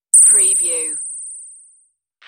Ringer

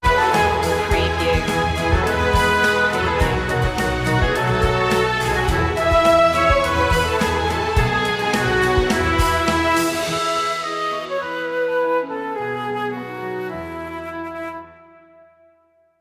orchestral; joy to the world; media music now

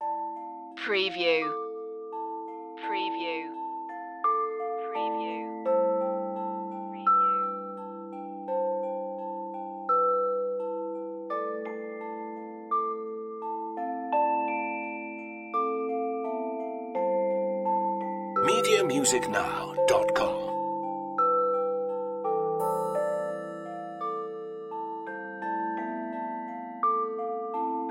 no synths

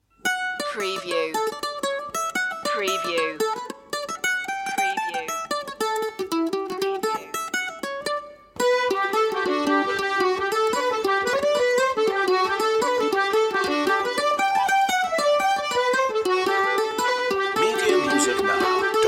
no guitar

